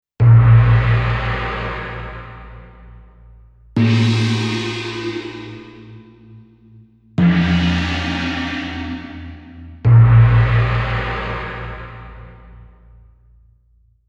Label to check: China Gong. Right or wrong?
Right